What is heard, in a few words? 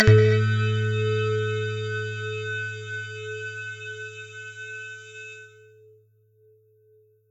chime; drum